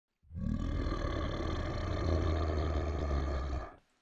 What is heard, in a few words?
monster scream